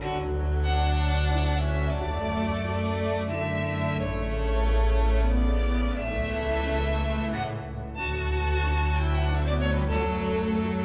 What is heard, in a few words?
Strings